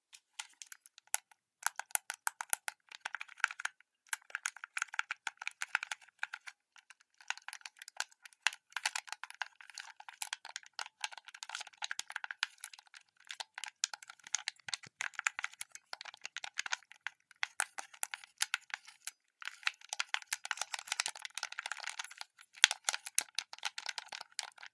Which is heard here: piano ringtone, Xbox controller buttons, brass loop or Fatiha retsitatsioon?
Xbox controller buttons